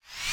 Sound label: whoosh; Heaven Studio Plus; Air Rally